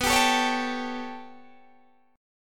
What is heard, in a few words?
B 7th Suspended 2nd Sharp 5th B, Guitar, Open D